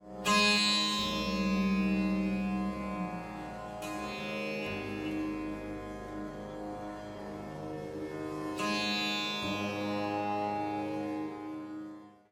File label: rudra vina; musique indienne